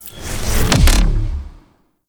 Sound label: Recall Charge Short; Warframe; Railjack